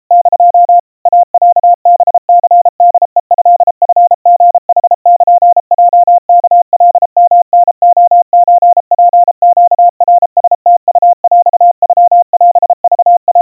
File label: iroha 110 cpm 700 Hz Crispy, Morse; Morse sample; cw; kawa